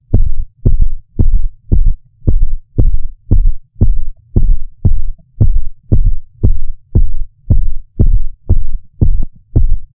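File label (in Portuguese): coração batendo de forma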